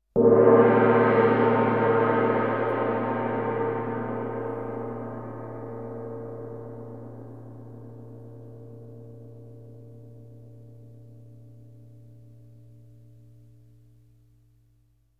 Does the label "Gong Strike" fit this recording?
Yes